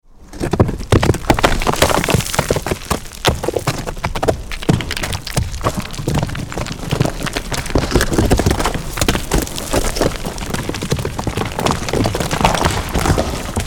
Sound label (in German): steinschlag nahe; waveland tonstudio